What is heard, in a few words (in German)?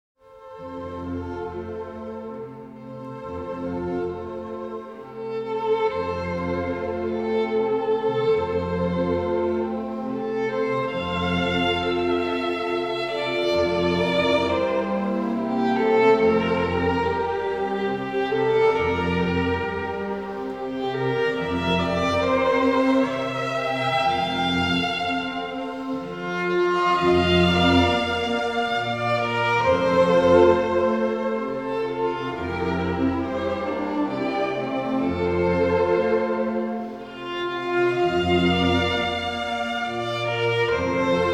Wiegenlied; Johannes Brams